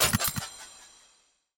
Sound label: upgrade loss